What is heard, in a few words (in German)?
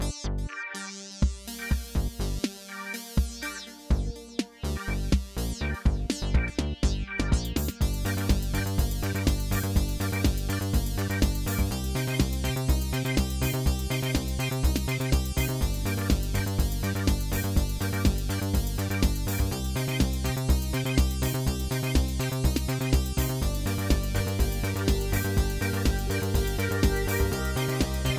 Pausemusik